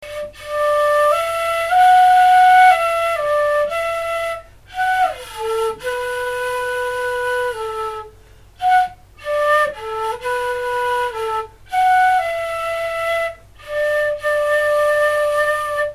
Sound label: flute G